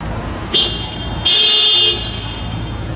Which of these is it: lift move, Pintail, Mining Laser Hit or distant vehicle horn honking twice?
distant vehicle horn honking twice